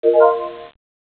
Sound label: Fourth combo; quadrapop mobile gamerip 2005